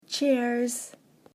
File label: cheers